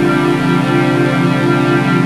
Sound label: Fluid Pad - 7A; Jungle; Pads; Blu Mar Ten; Jungle - 1989 to 1999